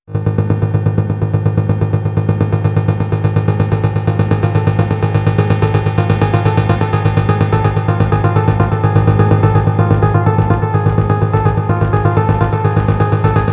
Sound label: Helicopter Pulse